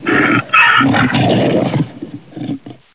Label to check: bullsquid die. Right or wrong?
Right